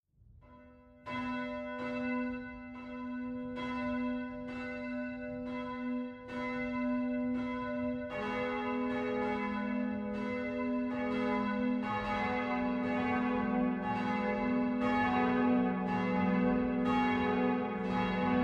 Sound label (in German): dom glocken